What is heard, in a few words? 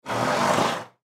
Horse Blow Lips, Horse Blow Lips Vibrate; Animal; Horse Sound Effects